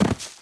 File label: footstep jump dirt